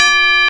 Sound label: Tubalar Bell, Korg M1; Drum Samples